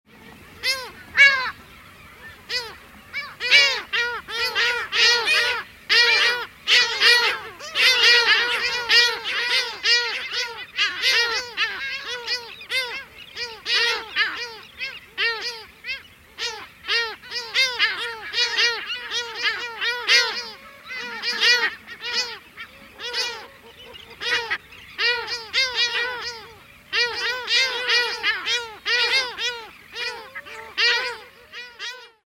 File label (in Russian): vstrevozhennye pticy